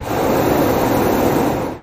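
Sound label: Hot Air Bllon Burn Me, Hot Air Balloon Burner; Medium Hard On, Off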